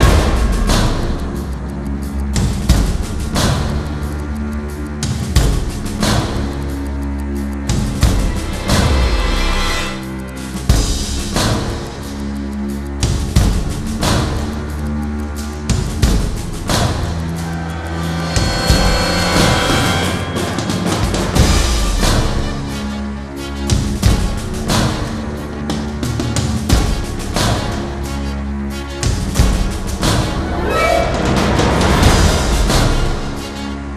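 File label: action space loop; transformers war for cybertron gamerip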